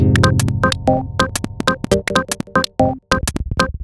125bpm, House Drumloops

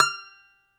DOMRA, Russian Strings

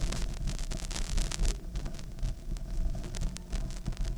Vinyl, noise

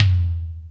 Rock N Roll, Drum Machines

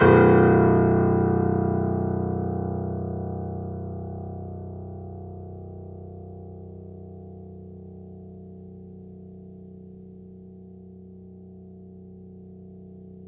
Mf B 6, Grand Piano off mic; AKAI S6000 CD-ROM - Volume 2

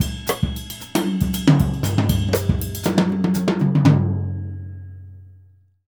Jazzistic; Univers Sons

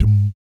BS DMM, Vocal Planet Jazz & FX; ILIO; BASS DUMMS

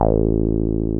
303 OW A2M, 303 OW BS; Drums In Your FACE!

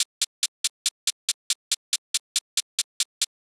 drum Loop 140BPM HH

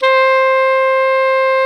C mid high, alto sax; Giga Samples Collection; Brass

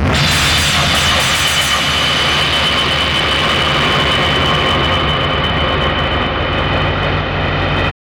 Insectus, Hard & Loud Techno; USB Soundscan vol.01; AKAI

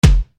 KICK, Yamaha RY-30

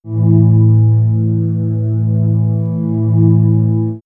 Swirls Ambient Drone, pads; X-Static Goldmine

